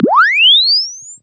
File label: Press Two Sides Chirp; Samsung 4 Recordings